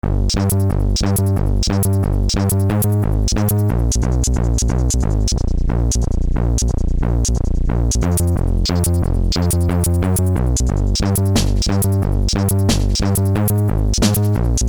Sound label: instrumental